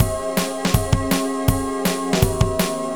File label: dnb; recreational research foundation